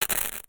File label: Short Shock; ambience